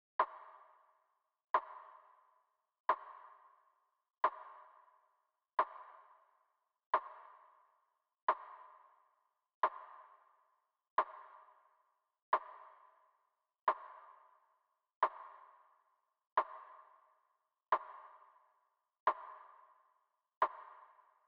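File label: Drum snare; 13 Festival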